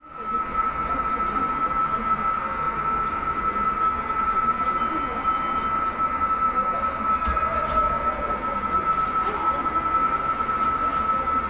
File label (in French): Alarme déréglée; Rom Concept